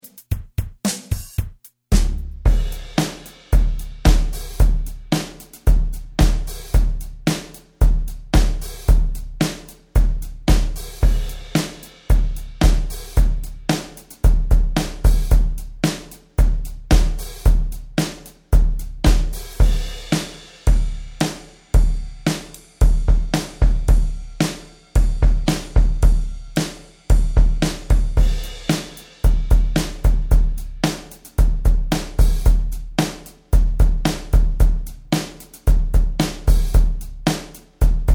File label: beat halo